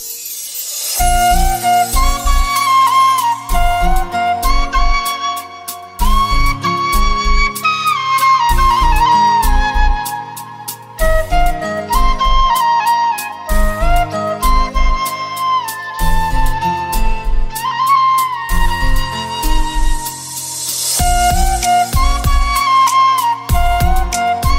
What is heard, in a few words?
sad flute ringtone